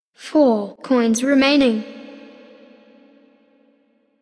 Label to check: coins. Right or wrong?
Right